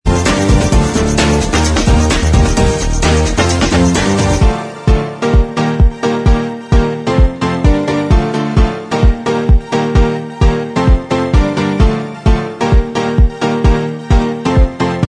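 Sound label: instrumental track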